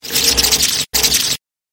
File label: monster bat